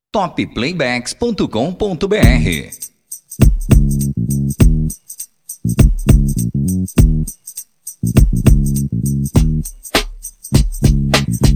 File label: CountryBeat